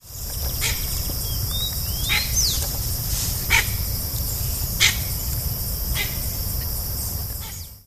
carcara, aves

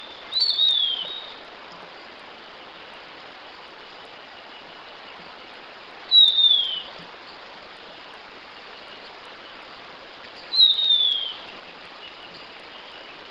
sovi

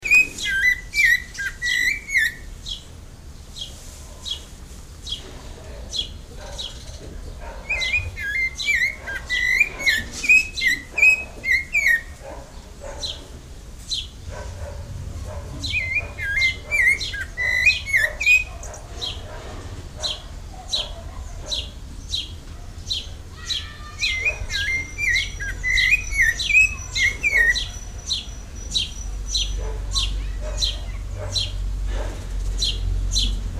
sabia-laranjeira, aves

